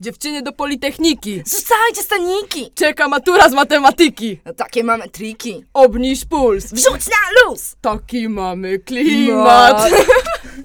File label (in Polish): Dżingiel radiowy; liderki I LO Głogów